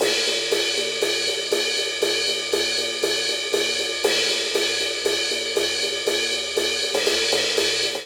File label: Classic Rock Cymbals Only 119 bpm; 4 4; MIDI Clips; Ableton; Factory Packs; Drum Booth